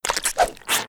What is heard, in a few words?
sfx leech; Animation Throwdown